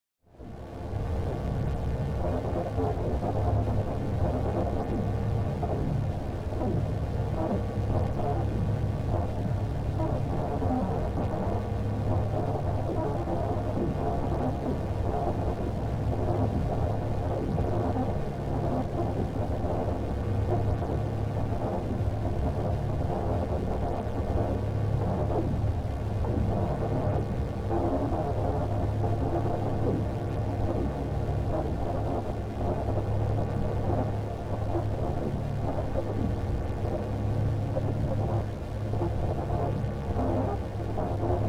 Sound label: Drone Phaser Warble Pulse; 5.1 STEREO MIX; Blastwave